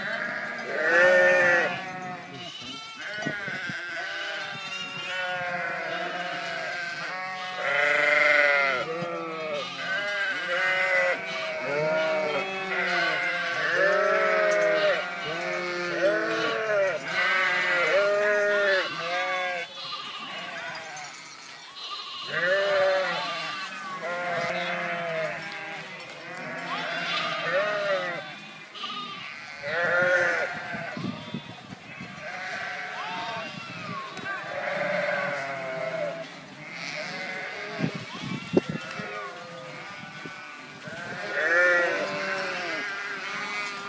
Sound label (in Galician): son ovella; animais